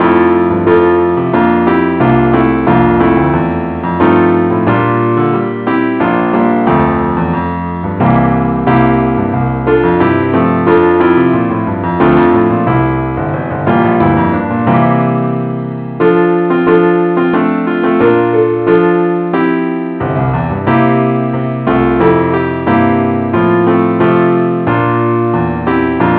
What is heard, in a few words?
keyboard only; key f; I Need Thee